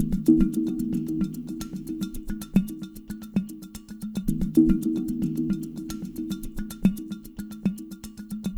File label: AMB; Spectrasonics; Liquid Grooves 1 & 2